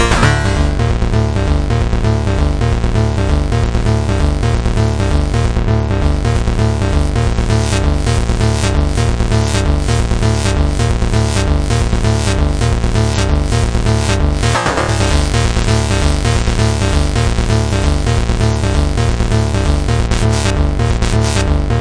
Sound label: arpeggio techno synth for remixing